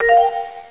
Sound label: Receive IM; Adium; AIM